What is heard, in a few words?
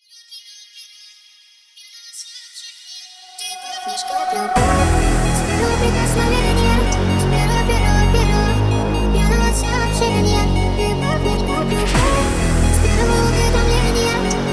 phonk remix